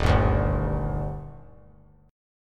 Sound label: D sharp Major 7th; strummed; JGuitar